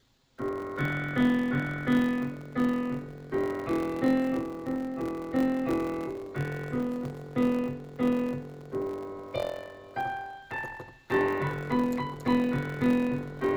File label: 180 degrees music